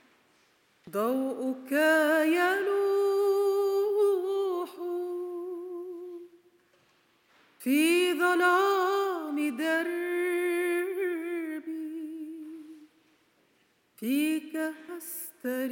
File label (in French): Chant d'action de grâce